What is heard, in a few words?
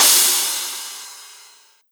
Crash; Electro Flux Sound Kit; ED Crash